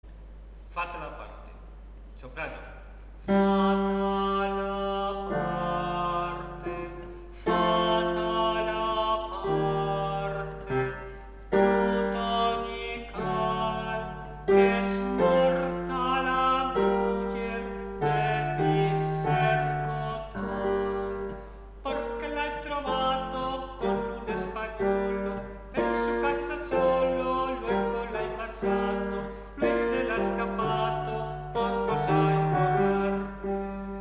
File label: fata la parte soprano; Juan de la Encina